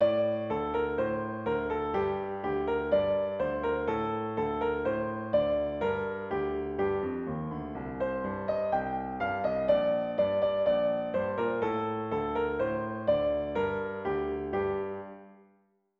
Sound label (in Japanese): ピアノ